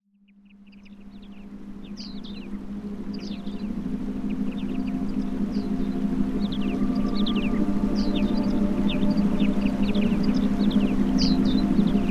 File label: Visualisation with Forest Sounds